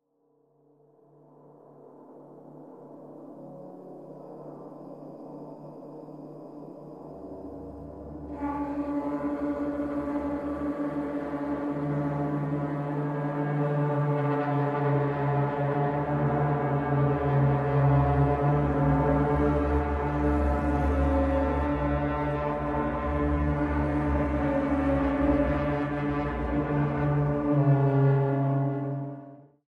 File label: Haunted Planes